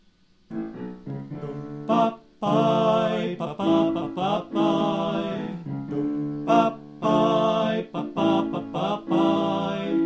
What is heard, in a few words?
tutti